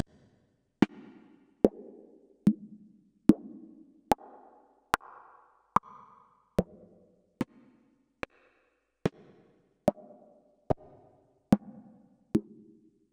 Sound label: Yamaha Tenori-On Handkit